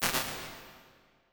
Ambience Hall, impulse responses, small spaces, halls; Apple